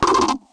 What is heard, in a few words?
laser trail